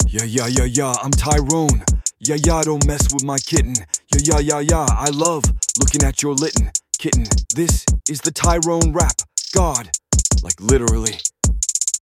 rap

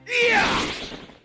yeeart

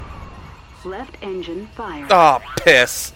left engine fire

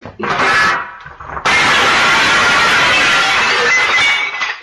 table breaking

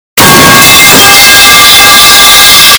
loud clang

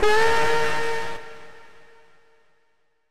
falling scream

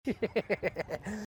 laugh

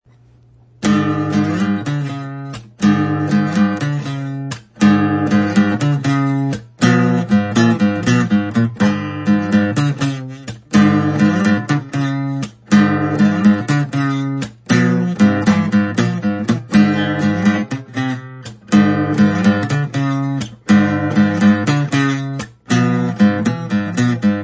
enter sand acoustic

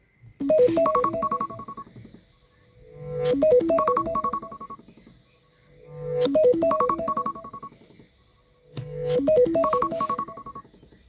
iphone ring; budilnik radius

